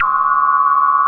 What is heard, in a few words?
SYNBAL, DRUMS + PERC; Rubber Chicken Software Sample Bank